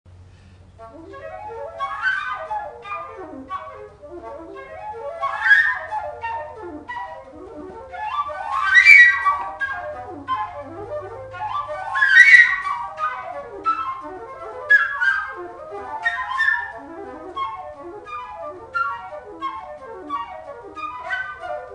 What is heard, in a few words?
Two Flute